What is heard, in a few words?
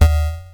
Crushed Perc, bitcrushed; musicradar